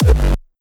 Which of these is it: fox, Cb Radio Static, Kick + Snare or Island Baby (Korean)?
Kick + Snare